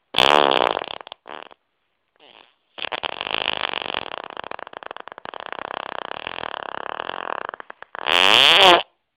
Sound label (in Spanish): Superpedo